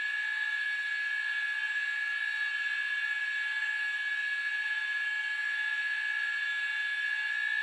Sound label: L-39 gyro, aircraft; DCS